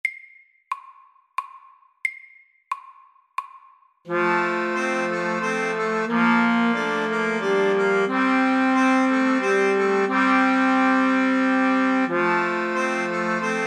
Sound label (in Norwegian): Musette MIDDELS